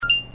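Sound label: Beep Short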